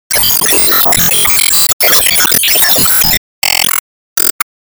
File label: 60sec Jingle